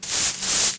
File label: SWEEP